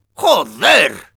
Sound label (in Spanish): negative vocalization, es; Demoman